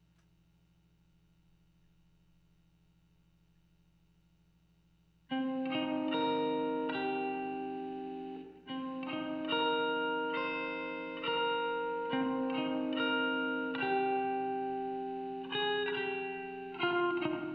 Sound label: gtr texture